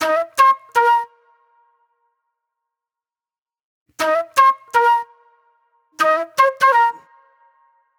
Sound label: Fast Funk Flute